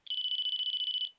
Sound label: ericsson t39 ringtones